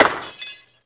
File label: light bulb shot; SpecOps2 MPlayer Demo